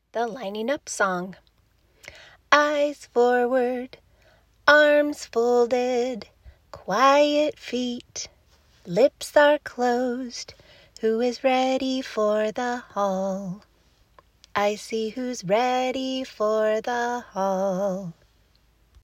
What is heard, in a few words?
Transition song for lining up